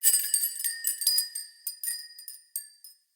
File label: Tiny Bells E; Fey Hub